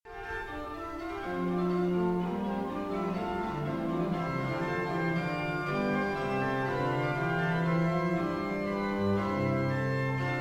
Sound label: Orgue, Capriccio Cromatico; Concert; MERULA